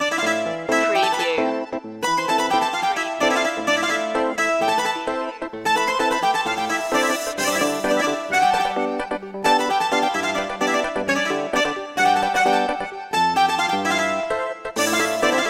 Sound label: egyptian dance loop